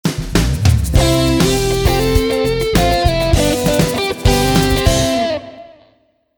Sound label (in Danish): Jingel; GPS